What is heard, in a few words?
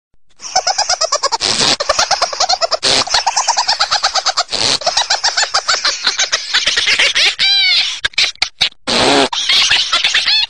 Crazy Laugh